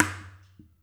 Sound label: ETHNIC DRUMS; Spectrasonics; Liquid Grooves 1 & 2